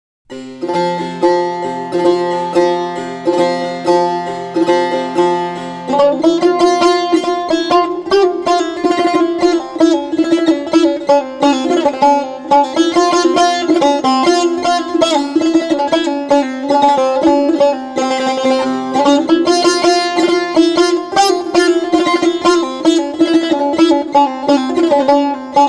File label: Tar